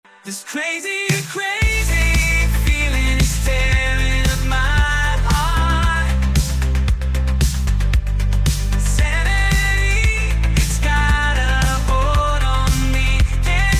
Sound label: Crazy Game short song